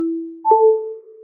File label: iphone magsafe charging sound